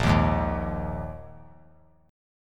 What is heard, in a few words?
Bb 7th Suspended 4th Bb 3C,3F,3A,3D; strummed; JGuitar